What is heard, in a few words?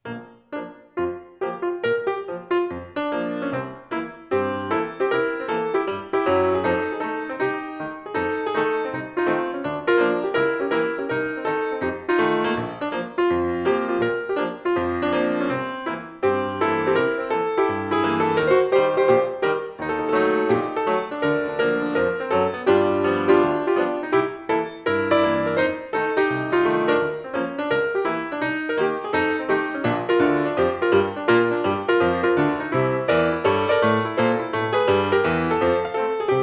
Ragtime